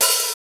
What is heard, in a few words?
HH, REAL